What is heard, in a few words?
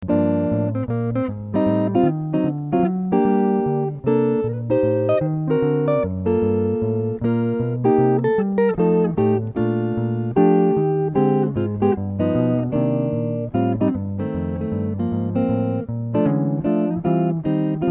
chord